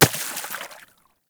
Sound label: Bullet Impact Water; Warframe